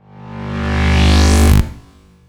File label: spawning